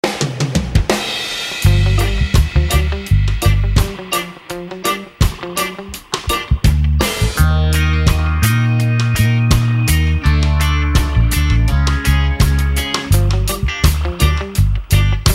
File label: Reggae; Hyppönen&Enbuske; tunnarit